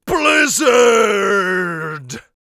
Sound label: blizzard, Mage Voice Pack